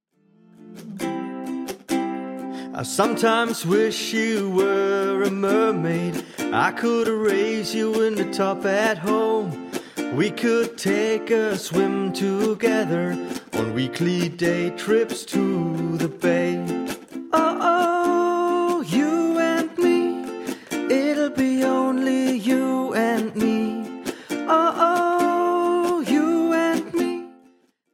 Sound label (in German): you and me in my pocket ukulele gesang